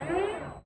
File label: motor Windup; enemies